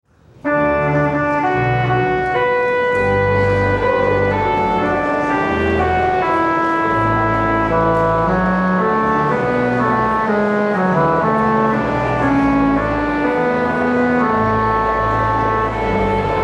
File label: organ postlude; Easter